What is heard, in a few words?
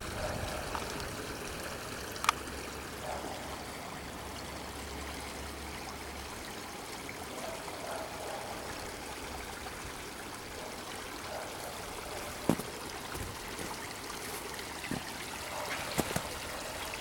ambience